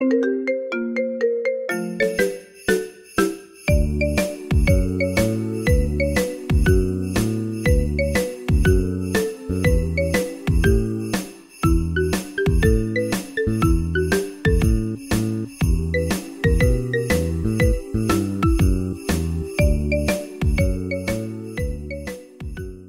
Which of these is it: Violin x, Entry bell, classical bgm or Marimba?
Marimba